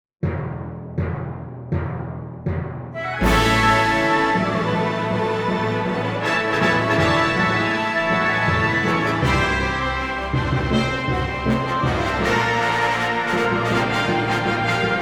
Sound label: Horns in Fifths; New Note C